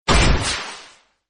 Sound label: flop jump sound; Arc of Templar